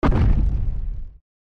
step; pianosaurus

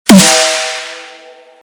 jumpscare; Garry's Mod; poppf triceradum cursed

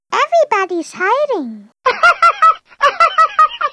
hunter warn; huggy wuggy snpc; elmo

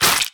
gore; dumpster baby titan

